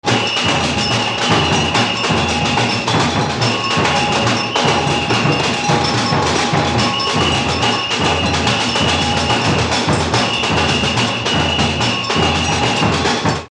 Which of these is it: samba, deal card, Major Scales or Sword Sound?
samba